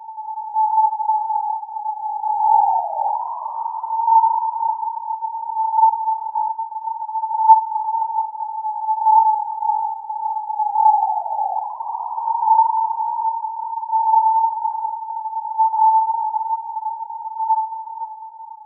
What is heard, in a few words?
UFO Retro Classic; Guns, Gore and Cannoli 2; 2018 pc